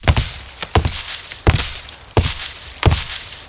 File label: walking woods; soundscrate